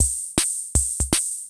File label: CR78